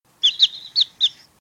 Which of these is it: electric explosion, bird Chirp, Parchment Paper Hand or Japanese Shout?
bird Chirp